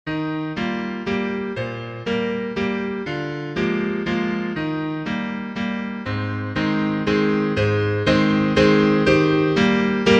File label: piano, sheet music